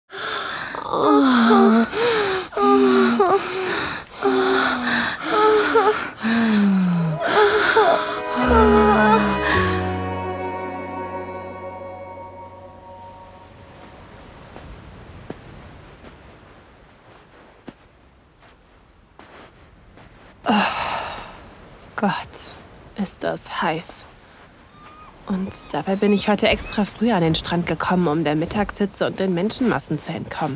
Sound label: female moaning; Am Strand; Sommer, Sonne & Lesbische Lust: Das erste Mal mit meiner besten Freundin (Sinnliche Geschichten); Sinnliche Geschichten Podcast